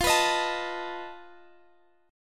F 7th Flat 5th F down Guitar Drop D

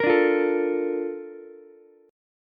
A sharp Major 7th, Ukulele; A# M7 strummed

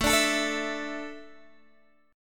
A Suspended 4th, down, Guitar, DADGAD

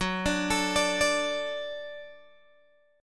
Gb Minor Sharp 5th Gb Guitar DADGAD

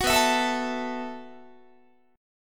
B 7th Suspended 4th B down Guitar; B7sus4; strummed

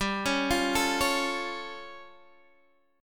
A Augmented 7th G

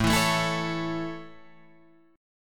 A Major, Guitar, Drop D

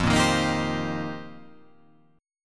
F 7th Suspended 2nd Suspended 4th

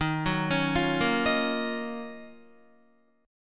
Eb Minor 9th Eb Guitar; Ebm9; arpeggiated; JGuitar

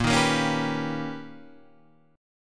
B Augmented Major 9th A sharp, chord

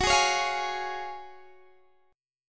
Gb Major 7th Suspended 2nd, Guitar DADGAD

